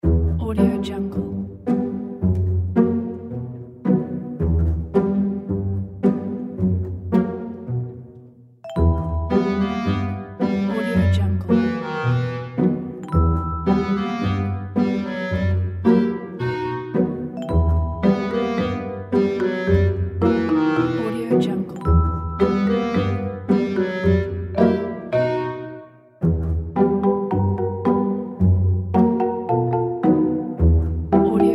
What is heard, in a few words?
funny pizzicato clarinet